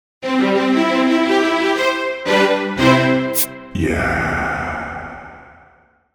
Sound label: jingle; natholdets jingle